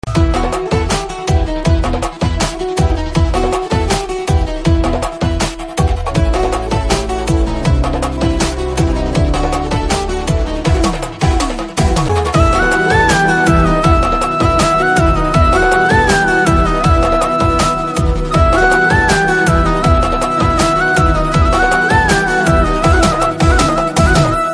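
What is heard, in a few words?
background, Telugu Ringtone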